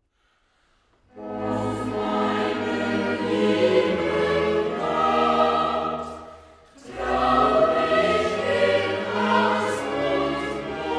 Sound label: Modulation; y12 sem2 exams